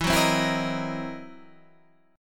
C 7th Sharp 9th Flat 5th E

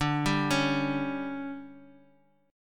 D Major 7th Suspended 4th, chord; DM7sus4; arpeggiated; JGuitar

